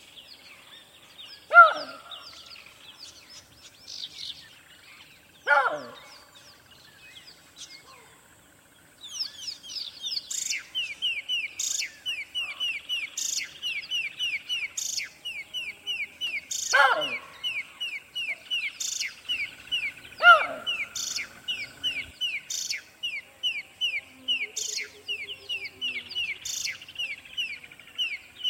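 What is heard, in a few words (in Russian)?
babuin, baboon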